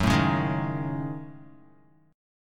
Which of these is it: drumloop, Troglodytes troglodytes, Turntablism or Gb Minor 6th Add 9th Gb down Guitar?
Gb Minor 6th Add 9th Gb down Guitar